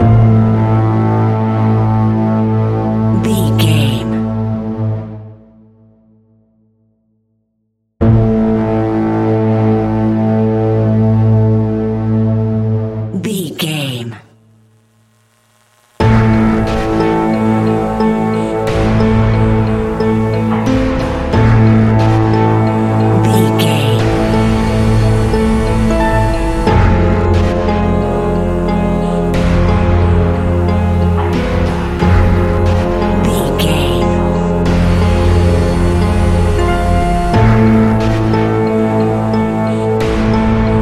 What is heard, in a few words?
modern horror